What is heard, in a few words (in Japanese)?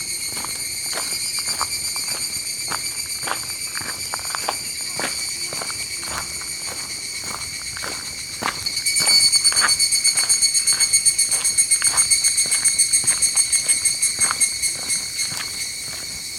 higurashi